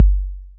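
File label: 808 bd, bass drum, drum machines; 808 Extended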